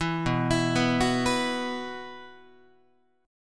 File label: B Suspended 4th, Guitar; Bsus4 arpeggiated; JGuitar